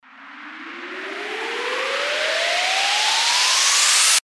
RISER

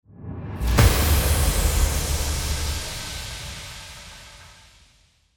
IMPACT

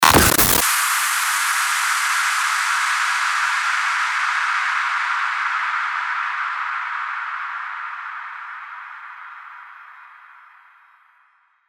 STARTER WHOOSH

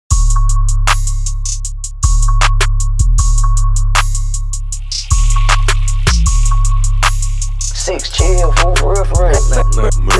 INST, 78BPM Abm

